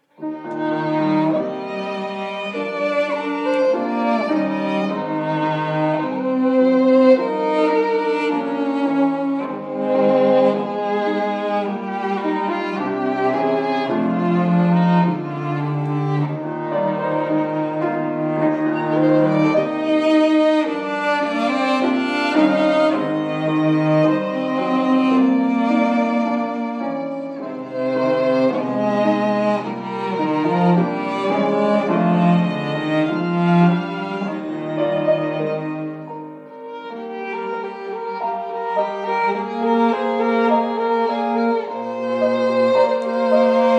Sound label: piano trio